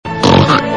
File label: snort